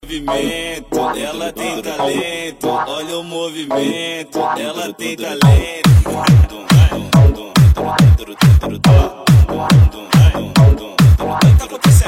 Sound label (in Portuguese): Batidão